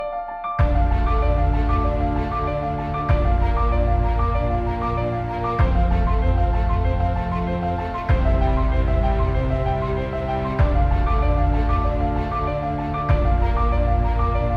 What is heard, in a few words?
song